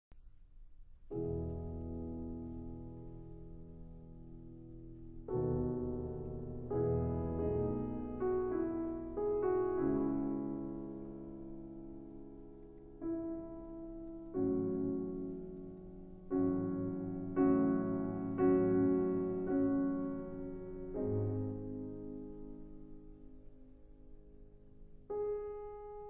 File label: beethoven piano concerto